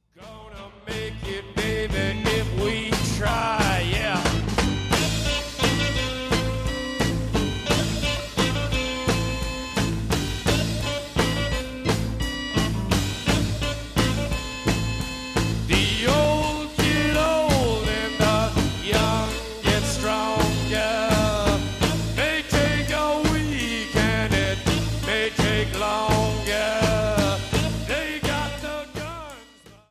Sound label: The Crowd Again Requests Their Favorites